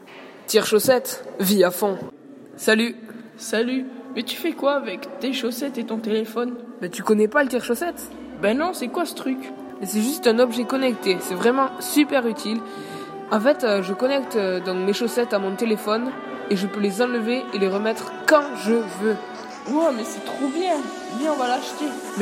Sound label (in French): Musique